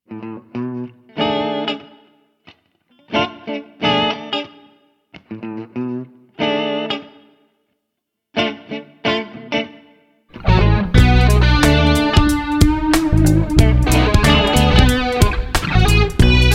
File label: hungarian major